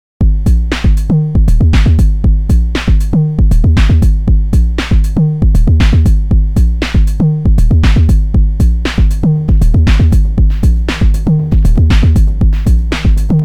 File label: simple delay beats; tyme sefari; modular demos